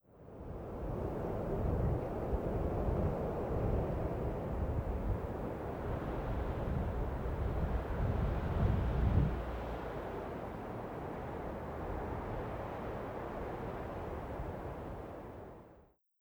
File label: Birjand Wind; Google Earth VR